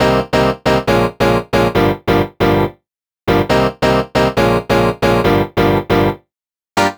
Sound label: Rave Piano Chord; Rave Generator 3; 90’s Rave & Techno VST/AU/AAX/CLAP Plugin